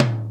Toms, Real

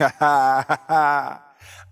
hsha haha; ooh ahh

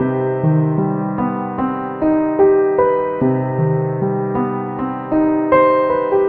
piano, sad loop

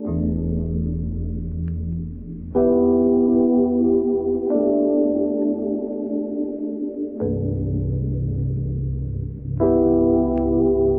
keys, Sad

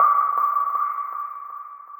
distant ticks; Zaps and Beeps

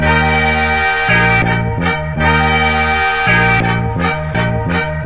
horns, Loops

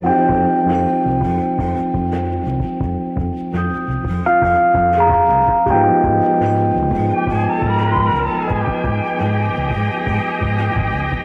keys, jazz loops

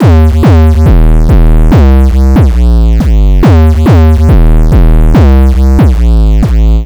bass, Drum loops

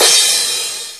Cymbals

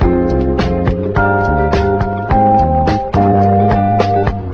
keys, Phonky loops

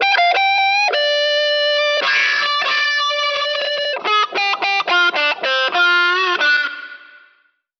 guitar squawky flourish, loops; Phonky

